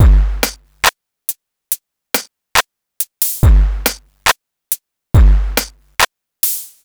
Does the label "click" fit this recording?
No